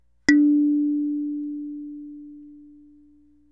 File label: Kalimba D4